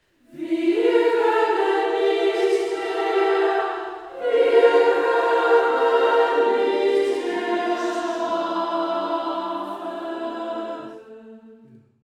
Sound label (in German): Auftakt Takt 35 ff S 3 im Tutti